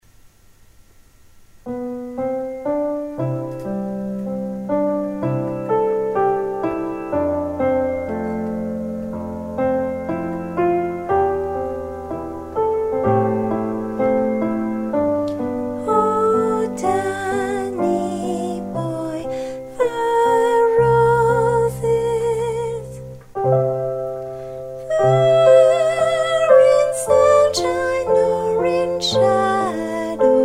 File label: danny boy soprano